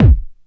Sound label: kickdrum sample g key; Duration 0:01; Samplerate 44100 Hz; Channels Mono; kick drum samples